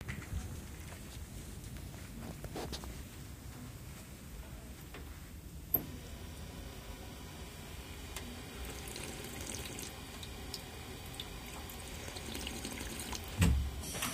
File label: fountain